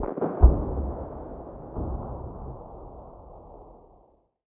cannonade; ambient; World of Tanks Blitz

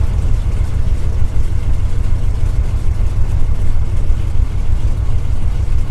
bearcat cockpit, engines; War Thunder

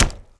Chop Wood; Civ4 warlords

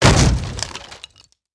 wood box mid; World of Tanks Blitz

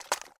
ui drop gun; Rust

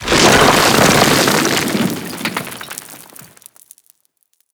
debris detpack cement; Explosions

